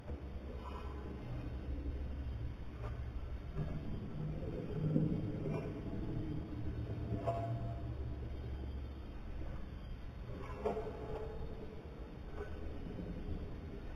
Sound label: Signal; Tamalan